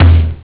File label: beng, drums snare; OctaMED SoundStudio V1